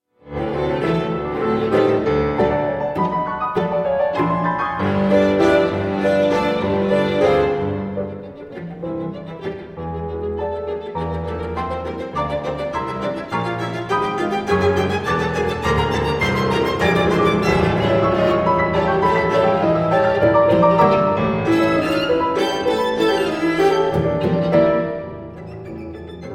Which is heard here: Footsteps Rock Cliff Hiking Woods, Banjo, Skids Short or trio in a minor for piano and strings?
trio in a minor for piano and strings